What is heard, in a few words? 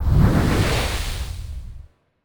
wind magic small; 魔法/法术的音效